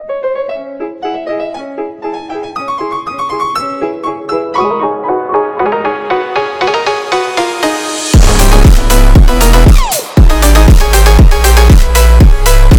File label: mozart phonk